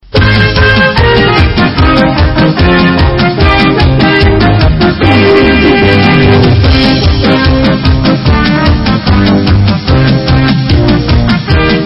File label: Hora, Instrumental